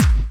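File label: snare drum bass drum, Dance Mega Drums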